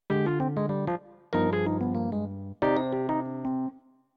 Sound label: minor, jazz piano